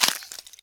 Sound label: climbing ice; Scrat